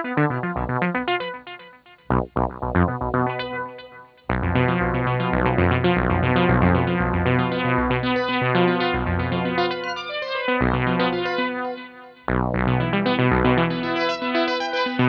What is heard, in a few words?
Jazz